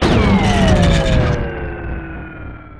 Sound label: power down